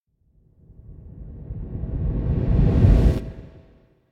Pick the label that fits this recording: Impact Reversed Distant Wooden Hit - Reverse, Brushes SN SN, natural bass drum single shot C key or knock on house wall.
Impact Reversed Distant Wooden Hit - Reverse